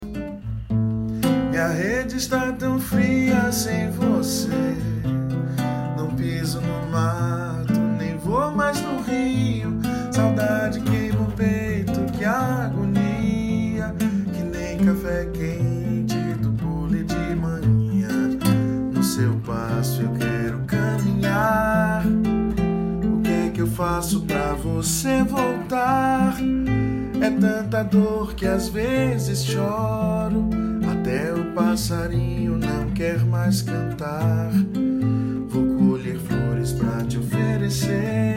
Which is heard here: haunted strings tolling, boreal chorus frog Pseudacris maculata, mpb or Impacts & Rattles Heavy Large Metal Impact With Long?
mpb